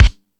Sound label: Lotsa Kicks, Drum Machines